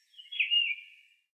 Birdsong, notifications; Google Pixel 4; Pixel Sounds

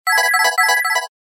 Serene tone; Samsung; SGH-E251 Plus

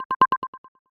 Notification Audio Tone; Apple iOS 18.1; HearingModeService

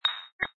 Volume Ring Tone; Bluboo Xfire-5.1

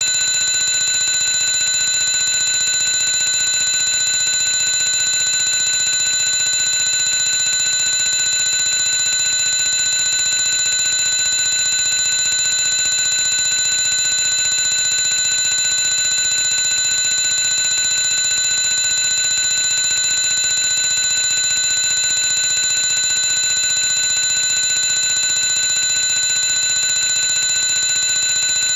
Clock; Fujitsu; F-02J; alarms

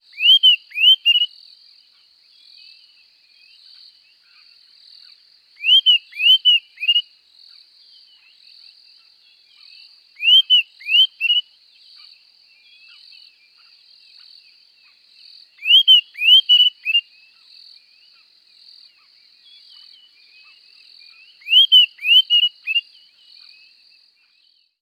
Botswana bushveld at dawn; Google; Google Sounds; Sound Matters

